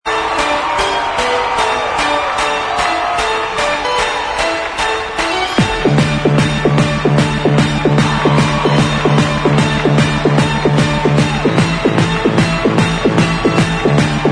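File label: instrumental, backing track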